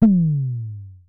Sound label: Synare Filter; Star Instruments Synare 3